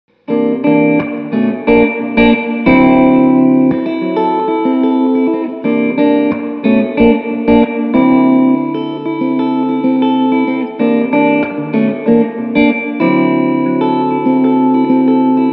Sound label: Both Springs Moderate Blend